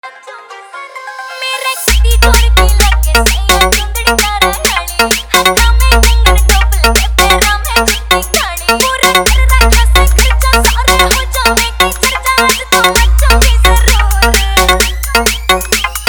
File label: Haryanvi Dance Mix Hard Baas Mix